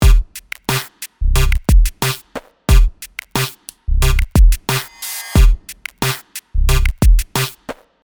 mono note default drums, sequencer; Numerology; Five12; mono note module

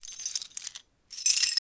Foley Keys

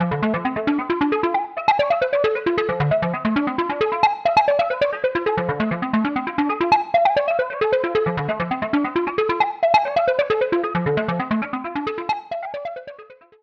KEYS Turbine

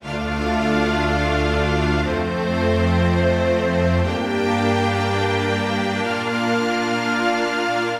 midi pro strings smooth voicings high

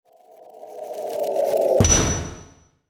Merge Metal; Tsugi

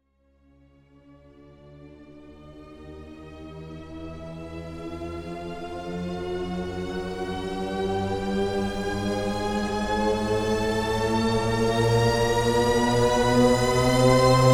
Stgs Shepard; Omnisphere

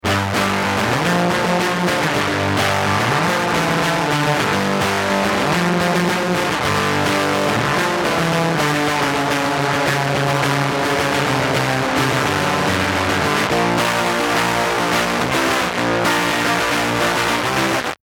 Rhythm Guitar Filthy; UAD Spark

